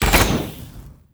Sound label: Archer Attack; Trinity Archer